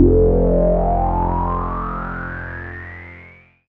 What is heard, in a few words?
Bass, Synths, 5U Modular; Polyend; Simulacrum Sounds; 1 Shots